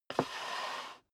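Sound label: step dress boots wood creak slide long, footsteps; schritte; menschen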